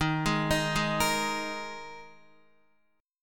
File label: D sharp Suspended 4th, Guitar; D#sus4 arpeggiated; JGuitar